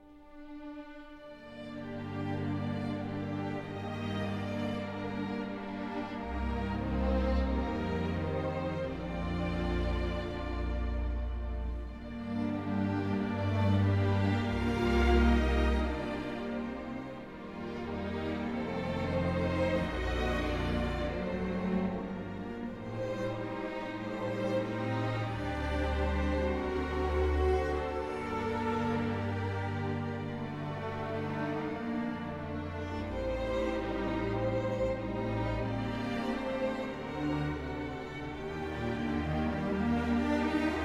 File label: strings only